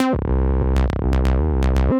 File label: Acid Steps, Sequence; Melacid Steps; Ableton; Creative Extensions; Racks